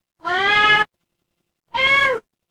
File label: animal cat meow